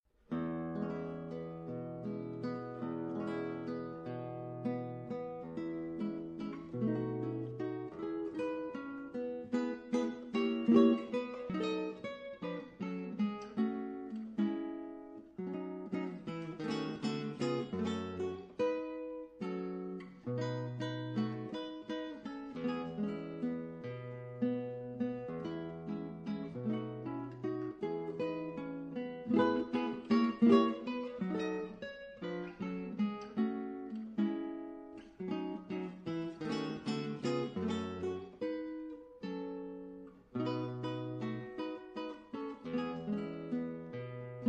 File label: guitar mexican folk songs; Manuel Ponce; La Pajarera